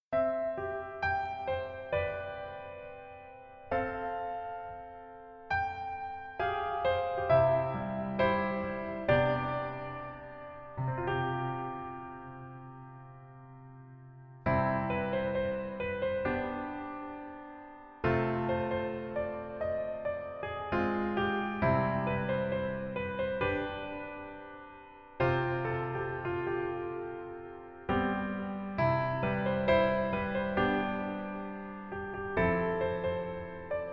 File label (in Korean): Piano ver, 멜로디MR, 여자키